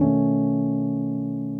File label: CHORD, Drum & Bass, 150 BPM; Ueberschall; Drum & Bass Flash Trackz